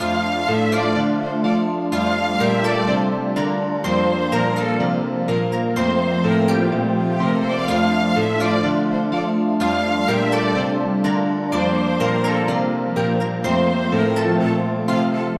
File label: Beautiful Violin